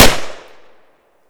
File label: grach shoot, weapon; metro